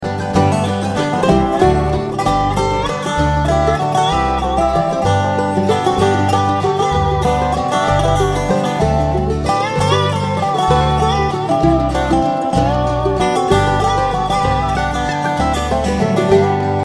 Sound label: wind jig